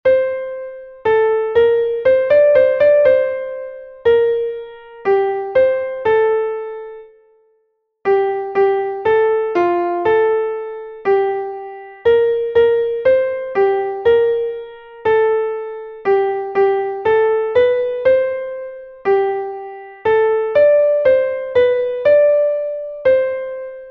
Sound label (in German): klavier; MelodieMeister